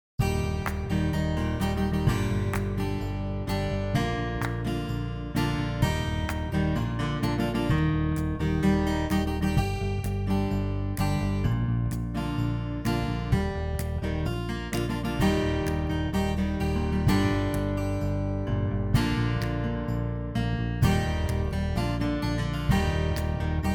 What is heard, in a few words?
Modern Folk Happy; Auto Compose; amperAI